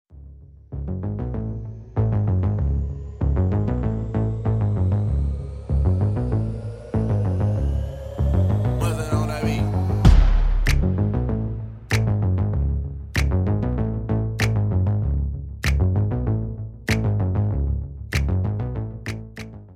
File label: endround; cstrike; DEX